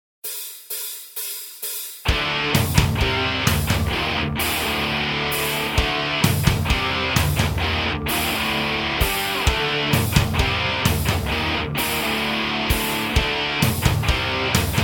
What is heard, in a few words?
bass metal, backing tracks